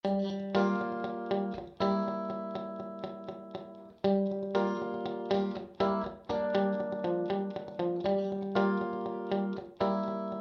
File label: Guitar Sony MU R201 Room; Vintage Digital